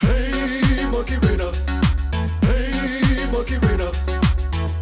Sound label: MENU TALLY